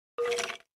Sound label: Skeleton; Minecraft; اسکلت در ماینکرافت